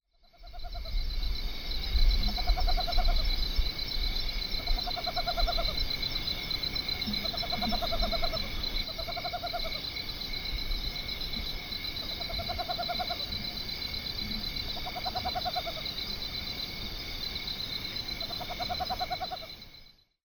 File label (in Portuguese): Corujinha do mato Megascops choliba; Jacauna